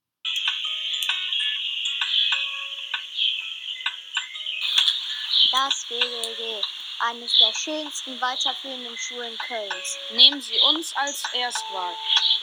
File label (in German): Musik; GBG Werbespots